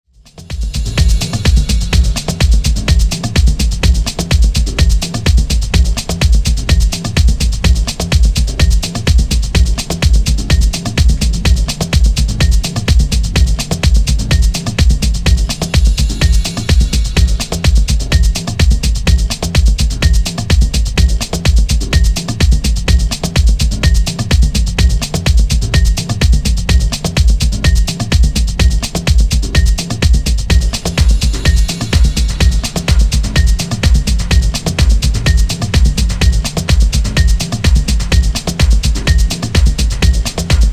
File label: Storms Beat